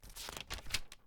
paper pergament pages turn flip; fvtt sonniss gdc audio

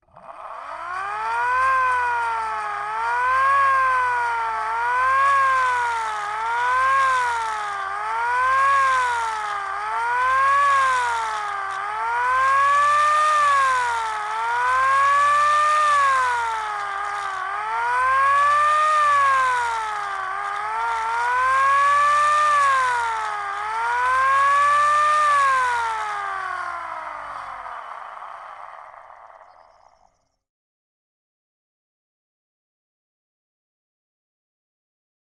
gaz-69 siren modulation; mkh8060

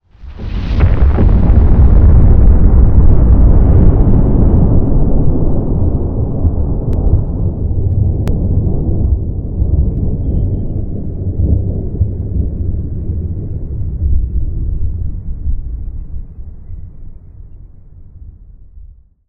nuke; lancer weapon fx

